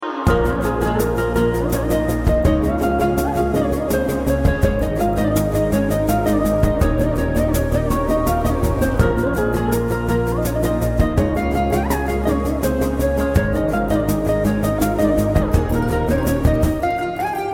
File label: Song Instrumental Ringtone